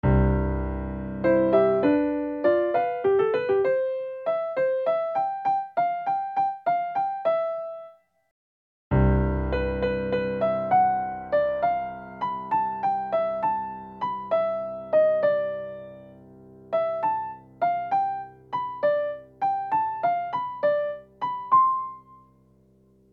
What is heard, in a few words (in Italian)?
Pastorale in do maggiore